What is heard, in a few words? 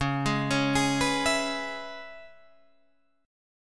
C sharp Minor 7th, Guitar Open D